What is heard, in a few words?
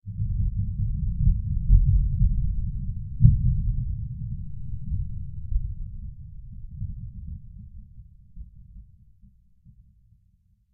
rift creak; between lands